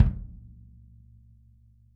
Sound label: kick